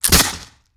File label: Musket; Sound Ideas; Guns